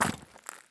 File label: sprint glass; Escape from Tarkov